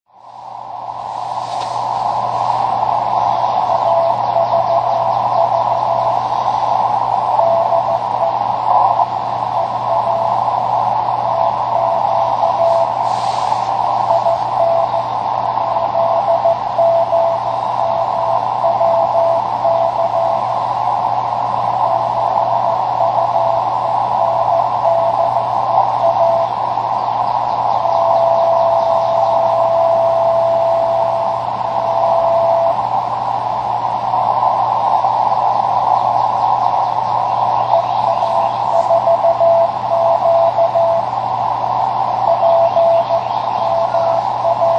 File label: double hop E, beacon, 10m